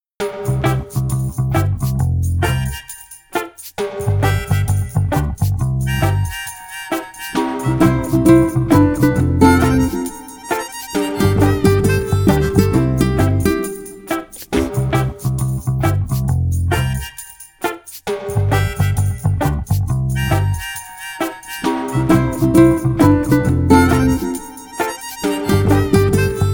Reggae Beat

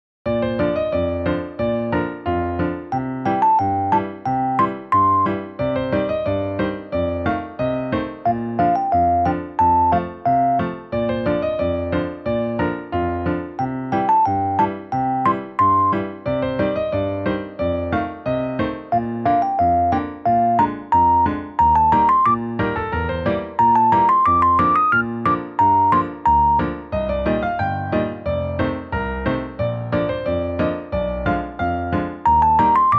Newage

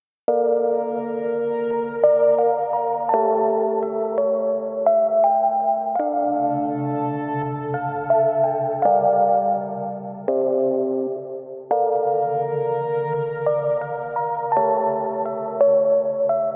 Bell Lofi; DRAMA STORY

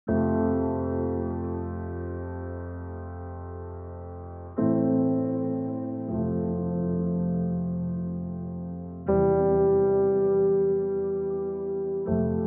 Low Tense, Tension Slow

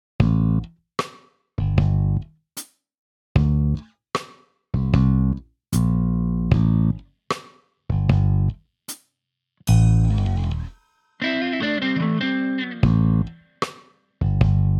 Dozen funk bass; Funky

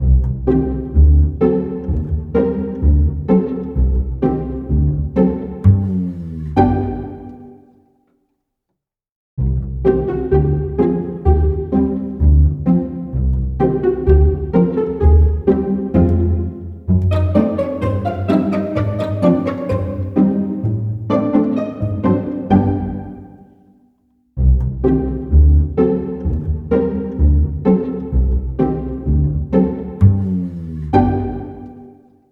Pizz; Comic 드라마

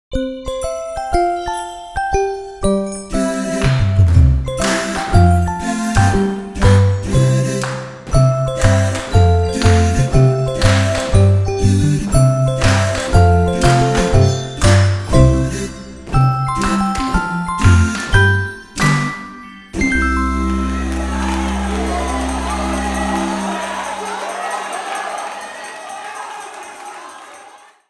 Village Concert, KIDS 귀여운

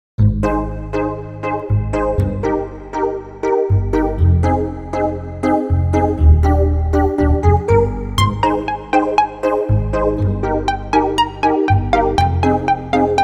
Sweet Ball, EDM Pop

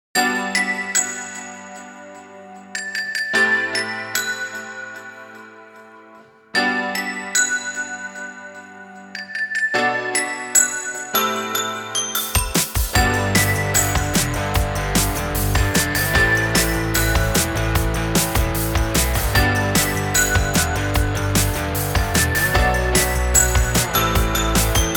Bell, Bright POP

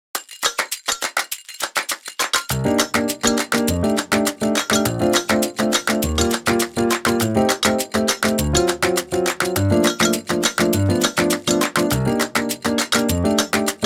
Bright Latin

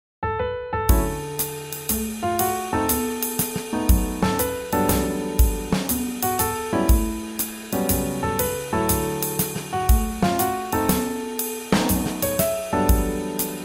Swing Jazz

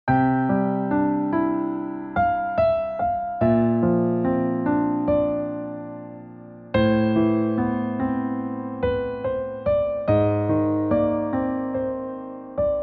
Newage, 감성

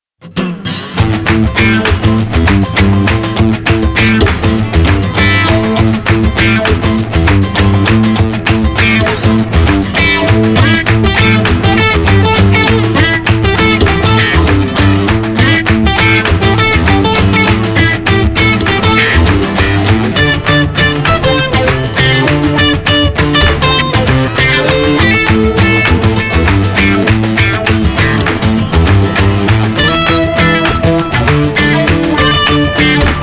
Hardcore, ROCK

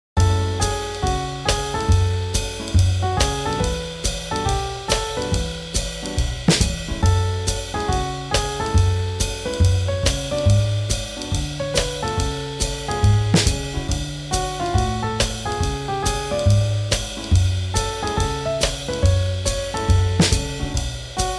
Swing Jazz

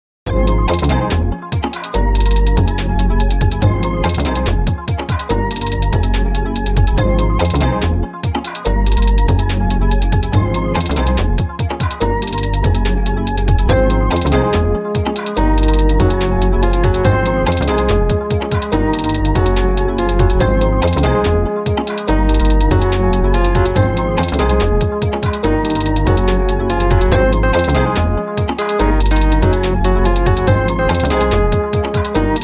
Playful; Bright Rhyhhm

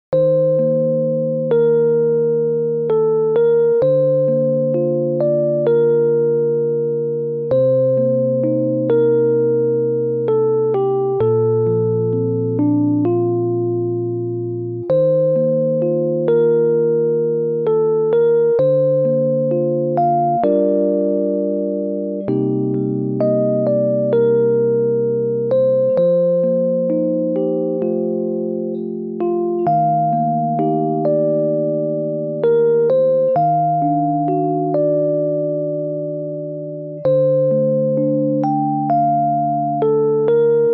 Newage, 감성

